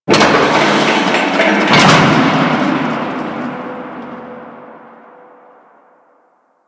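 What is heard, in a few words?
Shipyards Distant Machine; Warframe; Grineer Shipyards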